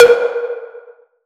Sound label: TREESOUND